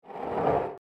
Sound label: ball rolling; powerball evo